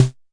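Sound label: mbrute percussion, Arturia MiniBrute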